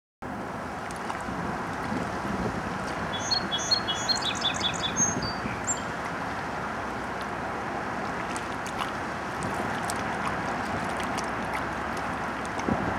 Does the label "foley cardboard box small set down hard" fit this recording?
No